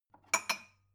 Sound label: glass take